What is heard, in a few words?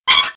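Generic Squeaks Low; Playable Tutorial Level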